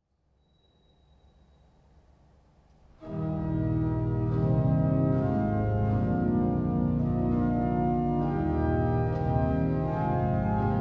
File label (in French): Fonds d'orgue; God Save the Queen